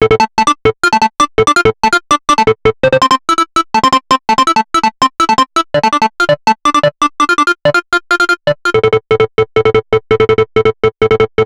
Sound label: Moog Messenger; 合成器; 工业